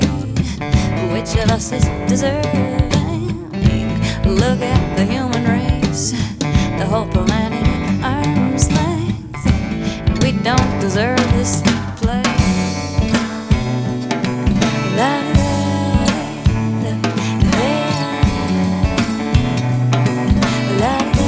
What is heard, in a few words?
rock